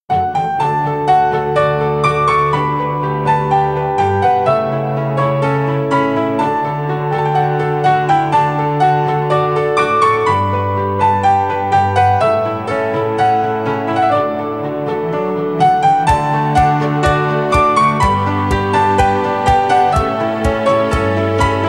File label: Piano Serenade